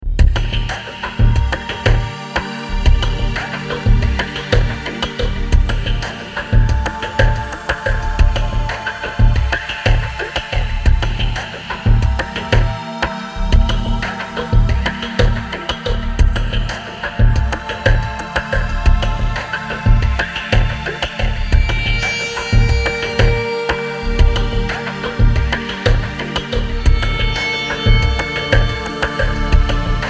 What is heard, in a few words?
Ambient WET; Cuttertone